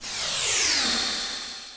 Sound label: sfx sci fi; Masterbits - Switch FX